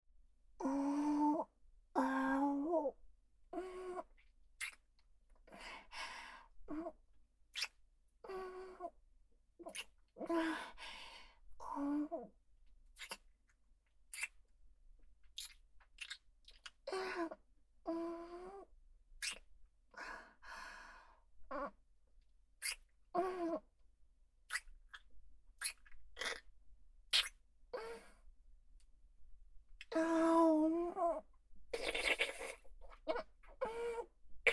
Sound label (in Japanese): 玉舐め; なりヌキ! ～忘れ物から始まる挟まれエッチ～; リカ; おまけフェラ